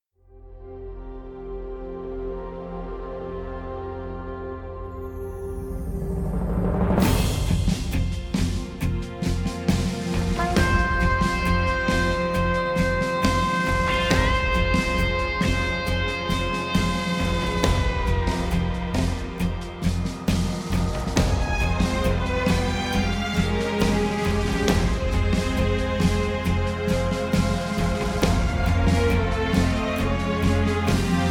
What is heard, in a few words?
Baasi Instrumental